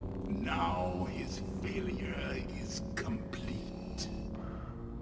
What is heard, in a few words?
failure complete; Humor